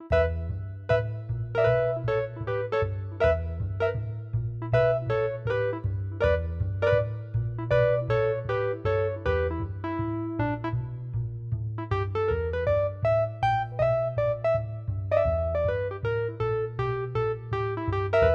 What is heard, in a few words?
pent